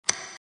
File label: Sfx Click; Mighty Knight 2